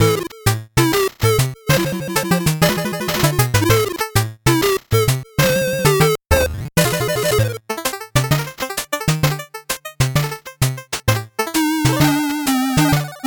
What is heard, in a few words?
8bit bgm normal